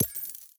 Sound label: drop gold; Furcherro